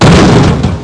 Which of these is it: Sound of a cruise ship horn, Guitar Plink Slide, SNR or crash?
crash